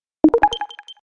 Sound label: success